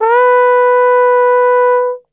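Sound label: B 2 trombone glissando, Brass Super Section; Best Service; TRMB GLISS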